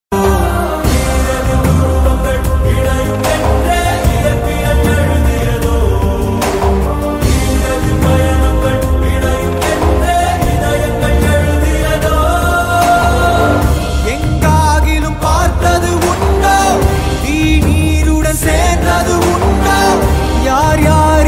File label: tamil bgm ringtone